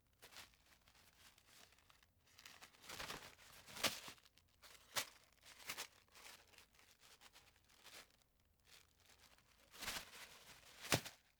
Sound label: Paper Crunch Search Trash; Fairlight Sound Lib